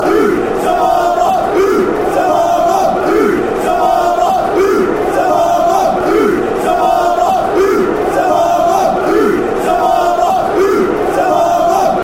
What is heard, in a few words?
ooh, fan chants